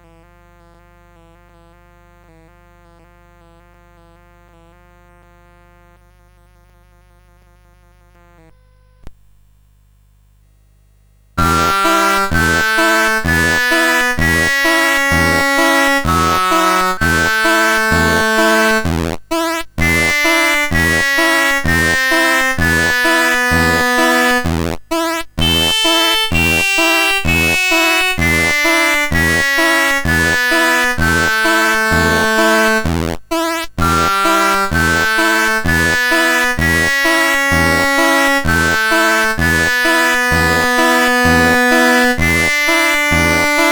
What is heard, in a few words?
Swing; Sports et Divertissements; CSG8580R5